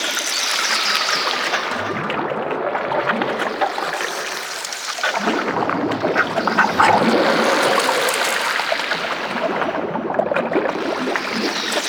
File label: Orchard Goop Loop; Props; Warframe; Tau; Ambience